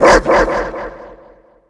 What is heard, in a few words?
Dog bark Stun